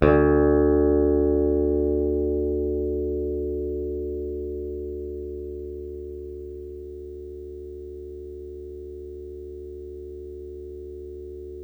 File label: PICK BASS, Electric Basses; PlatinumPhattCD2; E-MU Producer Series Vol. 8 – Platinum Phatt